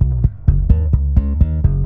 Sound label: Bass Eb 131